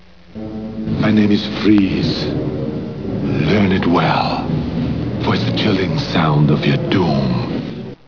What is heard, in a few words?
freeze